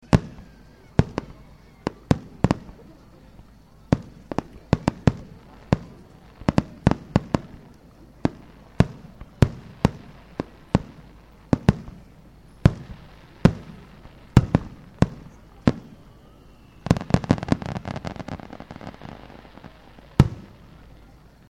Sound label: fireworks